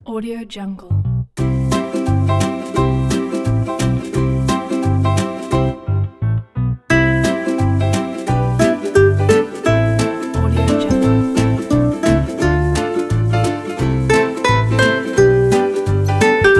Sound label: funny folk western